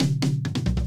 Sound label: perc, drums; mercure drums